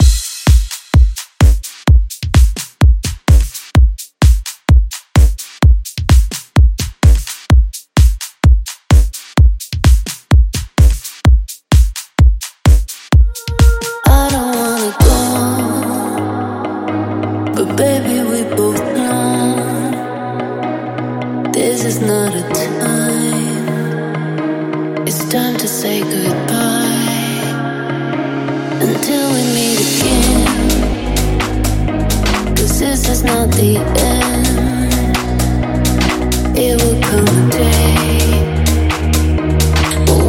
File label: Pop Rework; remixplanet